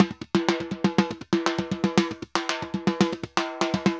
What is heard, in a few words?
TIMBA, DRUMS SAMBA; Best Service ProSamples vol.14; AKAI